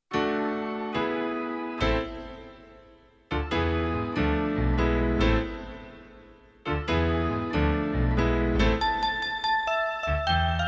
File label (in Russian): Мелодия на часы; День молодёжи